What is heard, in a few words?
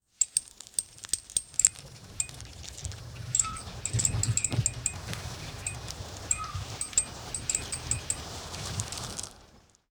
tiny mechanical clicks; beetle